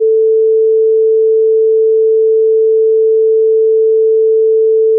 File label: sin 442